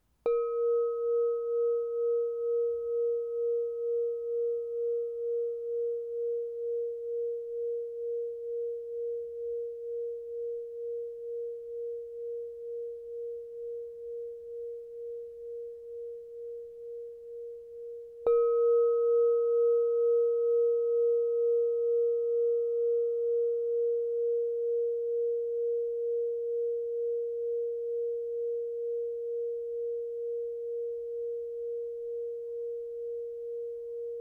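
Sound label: 6 inch A sharp +20 chime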